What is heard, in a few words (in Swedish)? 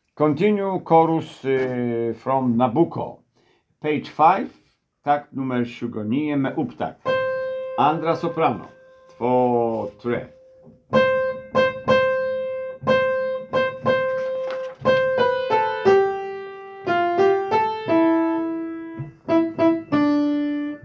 sopran